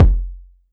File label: BD